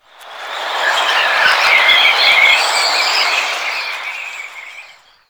Mountain Pass Wind Gust Whispy; Warframe; The War Within

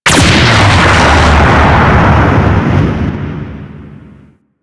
Mine Projectile Explosion

